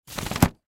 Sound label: Umbrella Open Underneath; Foley; Umbrellas; Objects; Blastwave